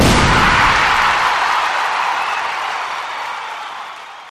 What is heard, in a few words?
big Win Start; football